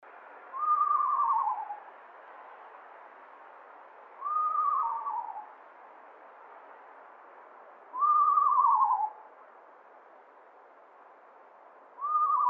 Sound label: screech owl